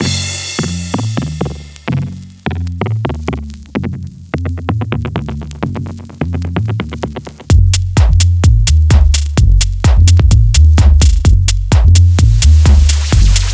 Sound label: BASS Essentials; INKA